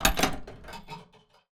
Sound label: phonebox coin in; Sound Effects